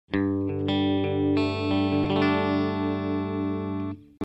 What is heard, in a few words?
Audio-Technica AT Pro37 Clean (Bridge Neck), guitar